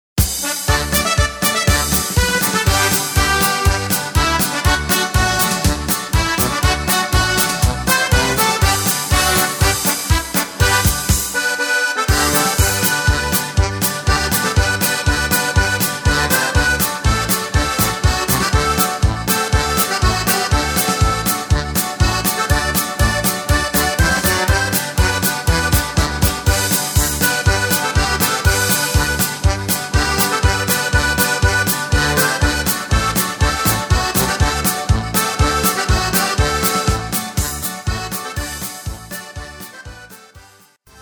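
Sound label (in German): Pfaelzer Stimmungs Medley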